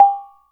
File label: CANANG G4, GAMELAN SET; Spectrasonics; Heart of Asia